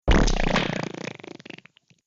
Muddy Puddle Splat; Slimy Sound Effects; sound design

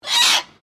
Bird Corella Squawk, Australian Birds; Birds (Sound Effects:Animals)

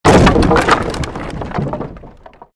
created wooden structure crash medium

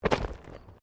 Body movement Impact Roll Road; Body movement foley to simulate falls and deaths; Rolling

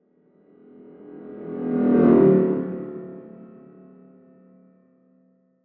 Sci Fi Spaceship; Spaceship Pass By; Spacecraft

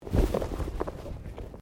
Body movement Clothing Foley; Body movement foley to simulate falls and deaths; Dressing; Human

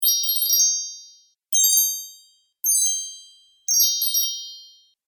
Fairy Chime Spell Collection, magic

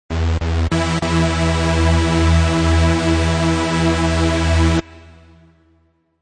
Lp Saw Synth End Em 98

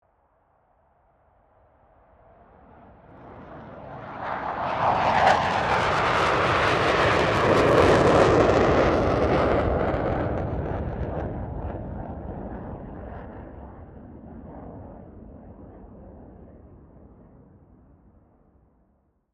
AIRCRAFT FA-18 HORNET PASS

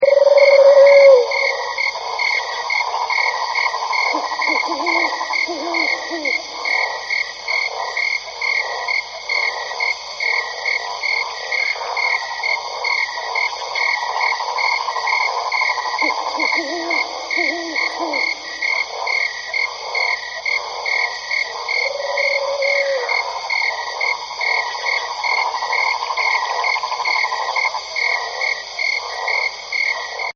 Summer Nights, Nature Scenes; Environmental; Partners In Rhyme

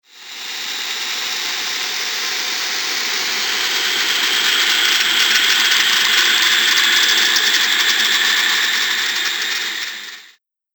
Static; Ghost Sound Effects; Horror Sound Effect

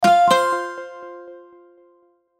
chime connect; Multimedia; Chimes; Blastwave

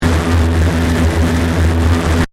Distortion Stab

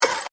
Device Movement, Door, Panel, Open, Scifi; Science, Fiction; Tech; BlastWave

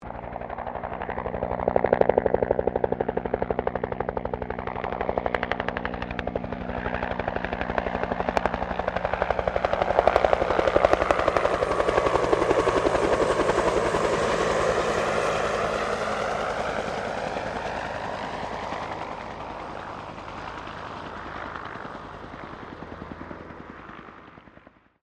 HELICOPTER 1967 BELL UH1 IROQUIOS PASS; RAN UH-1 Iroguios; Helicopters (Sound Effects:Vehicles)

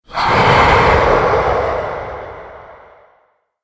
Mighty Monster Ghost Attack

